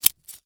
Industry Tool Scissors Open And Close

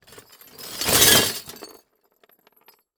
Foley Metal Pieces Bells Plastic Tub Rattles

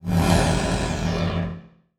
Transition Whoosh Laser; Production Element; Title Transition; AdobeCC

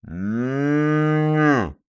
Animal Mammal Herbivore Cattle Calf Bellow; animals; AdobeCC

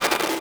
Multimedia Internet CD-Rom Flash Hi-Tech

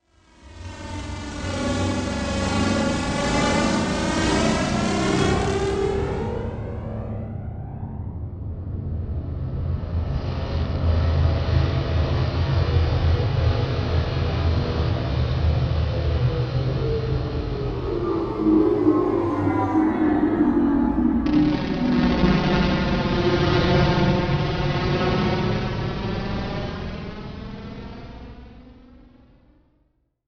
Empty Electronic Whoosh Phasey; Production Element Title Imaging

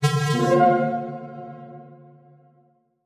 Transition Musical Tone Medium; Production Element; Title Transition; AdobeCC

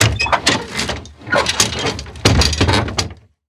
Impact Wood Squeaks With Impacts

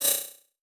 Multimedia Internet CD-Rom Flash Click Multiple

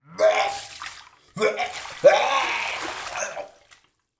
Human Male Vomits In Bathroom Sink

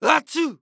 Human Sneeze Male

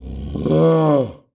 Animal Mammal Carnivore Grizzly Bear Roar; animals; AdobeCC

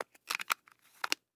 Remote Put On Cover For Batteries, Household

